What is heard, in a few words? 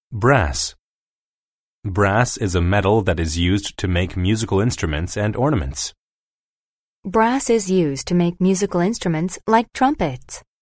brass